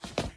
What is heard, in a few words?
Skip Walk; lethal company; ambience; windows